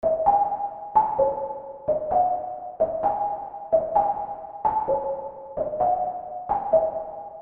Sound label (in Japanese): レゾナンスの自己発振